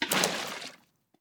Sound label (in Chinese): 鱼桶倒出声